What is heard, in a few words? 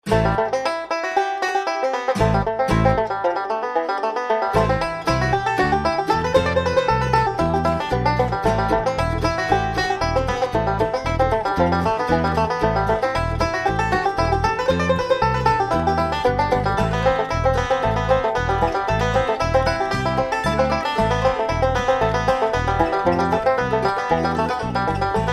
fiddle